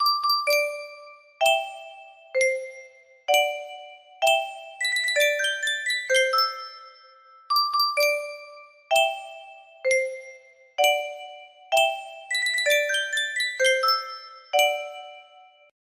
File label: Sankyo Custom Tune Music Box, music box melody